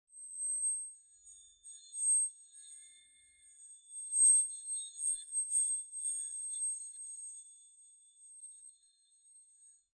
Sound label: mystical